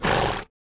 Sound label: horse nose